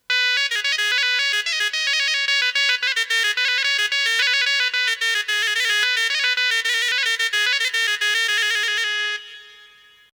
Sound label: Zurna; korg; EXB Solo Instrumente